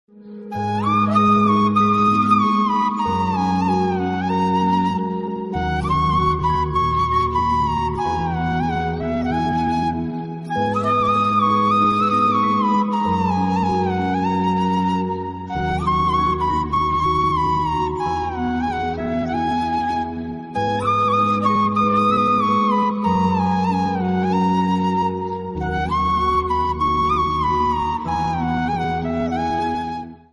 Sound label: Instrumental Ringtone